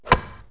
chop wood; Wired for Sound Pro CD; Aristosoft, Inc.

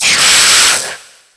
barracuda attack; DAoC

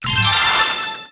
bonus

